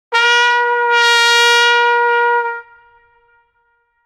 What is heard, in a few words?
playful trumpet whoop